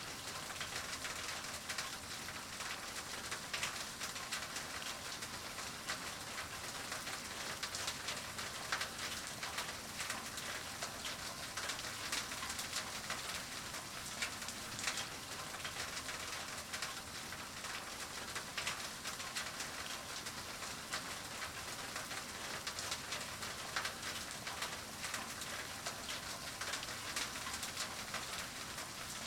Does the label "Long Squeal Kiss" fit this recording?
No